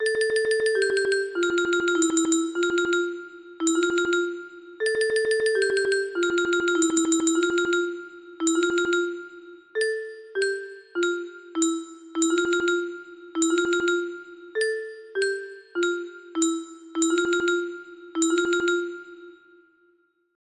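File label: music box melody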